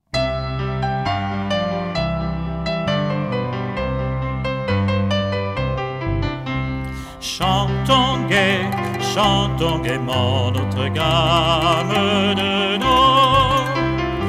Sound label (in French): La gamme - mélodie; Ecoles Qui Chantent 1999-2000